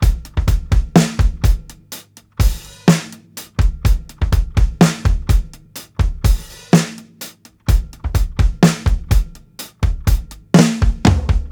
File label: 125 HALF TIME, DRUMS LOOPS; BASEMENT DRUMS VOL 2